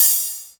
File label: sparkly open hat one shot g sharp key; open hat samples